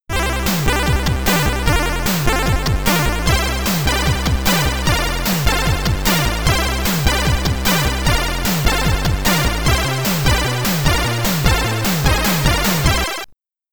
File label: Stage Clear (SID Stereo); qix commodore 64